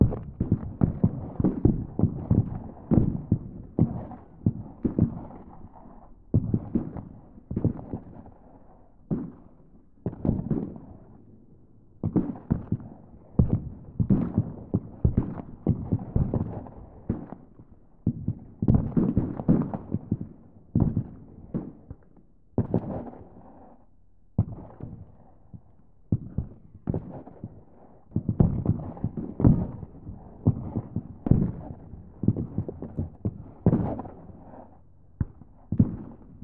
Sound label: ambience far gunfire